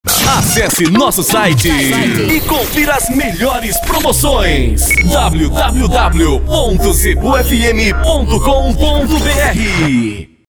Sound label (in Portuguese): Vinheta; Zebu